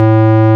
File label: ORG Farfisa Clarinet A 5